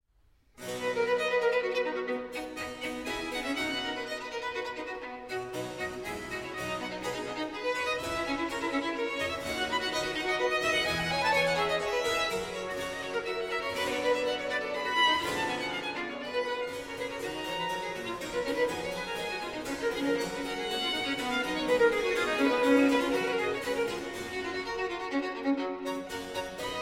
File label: Trio Sonata in C Major, Allegro, for 2 violins and basso continuo; BWV 529